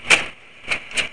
Slide

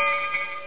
clank

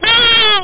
trog die sound; Leprechaun™ Demo V3.0

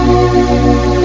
Blow

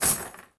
chain; kult

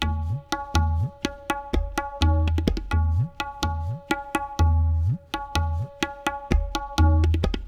PERC LOOP ETHNO 125; eJay

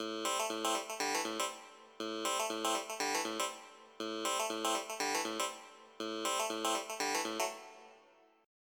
Clavi; Roland - An Invitation To The Roland World Of Music

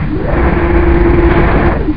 HYDRO; Duke Nukem 3D Kill-A-Ton Collection

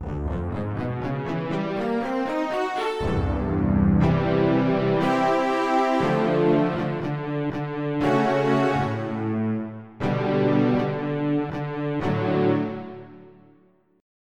Tremolo Stringz

